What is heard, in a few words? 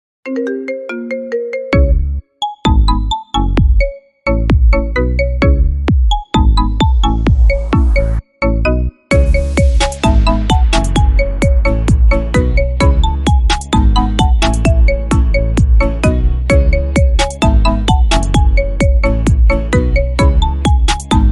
Marimba Remix Instrumental